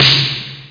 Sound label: MT32 snare